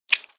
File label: click